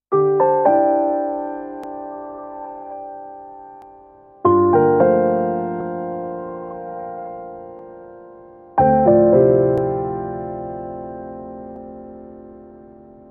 Nokia lumia keys

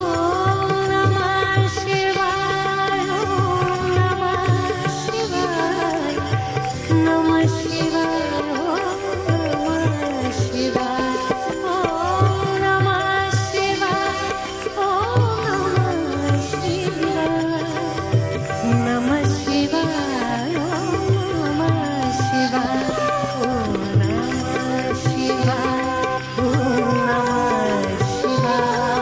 om namah shivaya

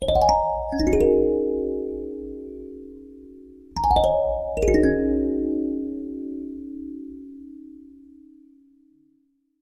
ubuntu touch mbira; ringtone

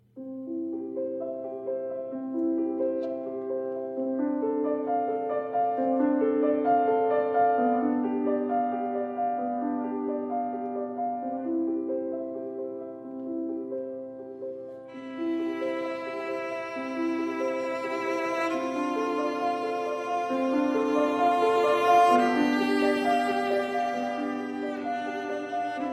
harp violin

